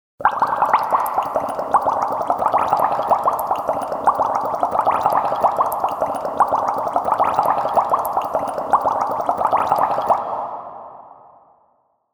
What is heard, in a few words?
water